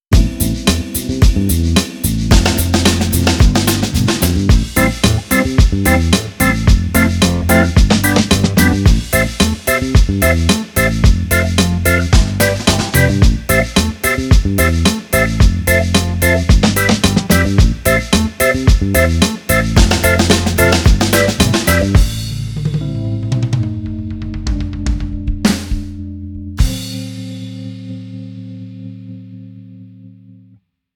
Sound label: sweetness; by burak sound bank; cheerful vol 2